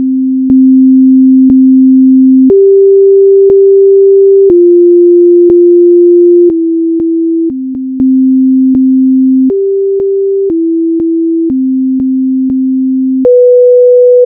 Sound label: sine